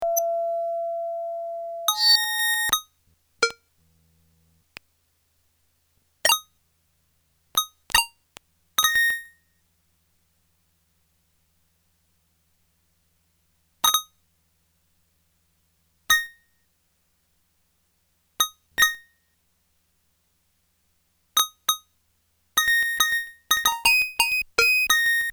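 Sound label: electric yamaha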